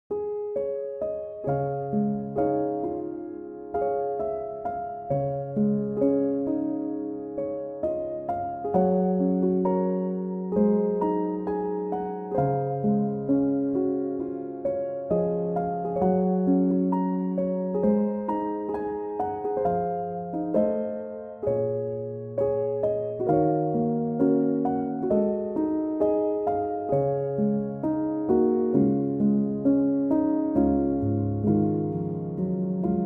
piano instrumental

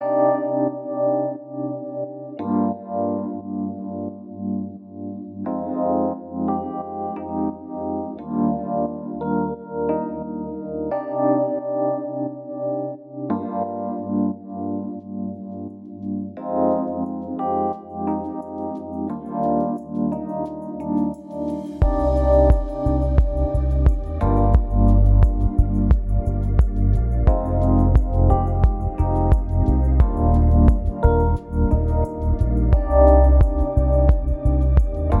Smooth Breeze, LUXURY AMBIENT

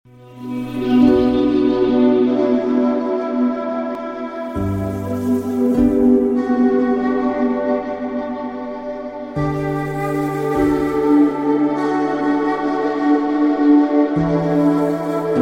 Ambience

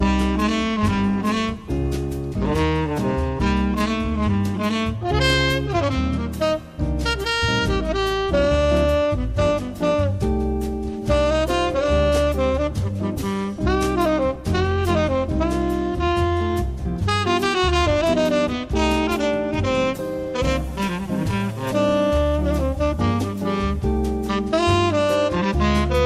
Bossa Nova Quartet With Tenor Saxophone Solo

